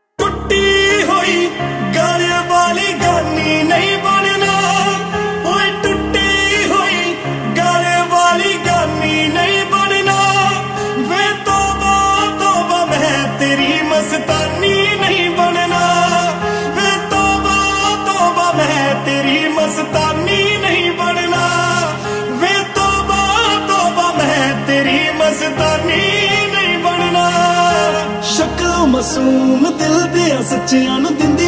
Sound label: New Punjabi Song Ringtone